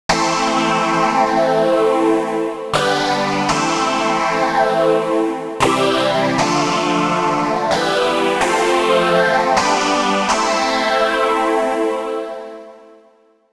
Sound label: pop hit